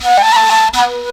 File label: FLUTE GRV; Best Service; World Colours